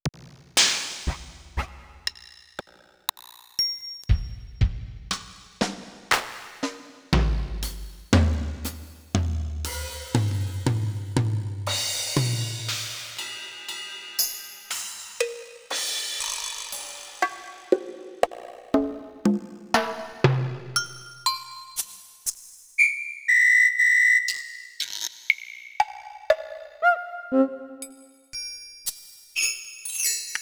Drum Set

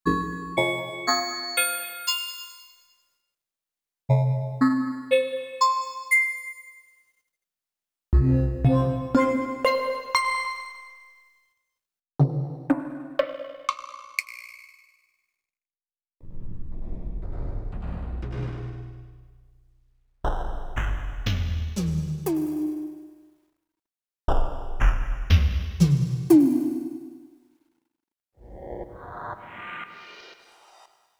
Percussive

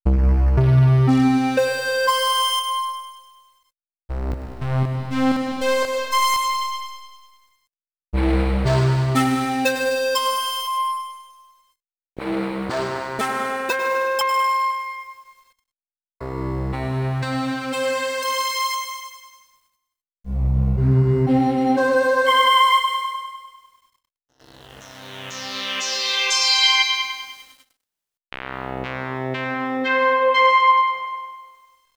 Synth Lead

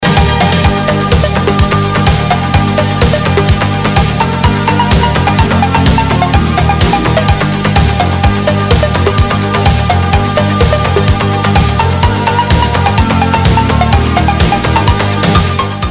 Synth pop

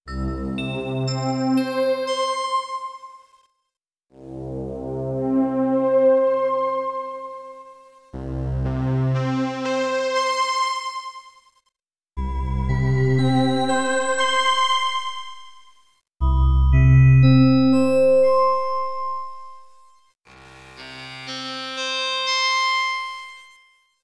Synth Pad

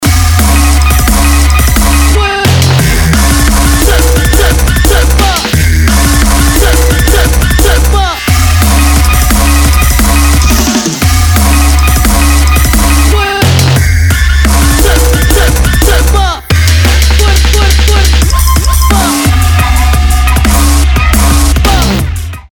Drum And Bass

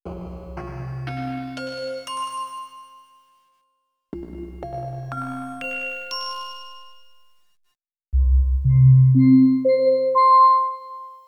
Chromatic Percussion

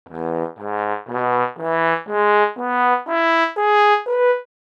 trombon

